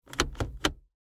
car stick shift

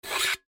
Can friction

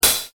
BIG HI HAT

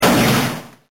pistol shot

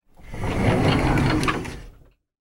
door sliding

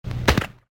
Skeet Shooting

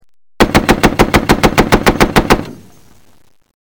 Tank Machine Gun Fire

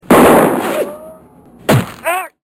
bullet

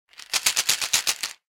tablet bottle, Boing

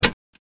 click

